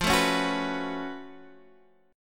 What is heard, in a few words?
F sharp Minor Major 11th F